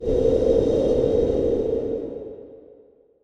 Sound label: tundra planet, ambient; Stellaris